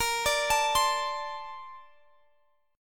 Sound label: A sharp Major 9th, down